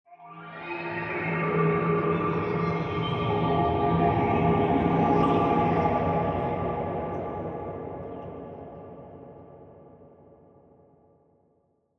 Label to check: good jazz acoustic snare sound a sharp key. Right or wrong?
Wrong